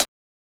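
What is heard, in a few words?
hi hat; E-Lab; X Static Goldmine 1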